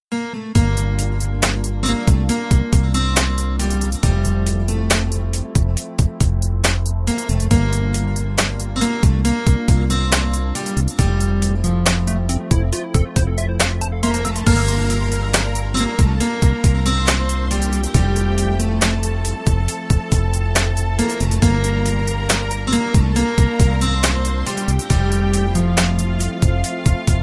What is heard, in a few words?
love melody ringtone; samsung ringtones